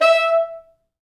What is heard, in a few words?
E4, Pop Brass; Best Service ProSamples vol.19; AKAI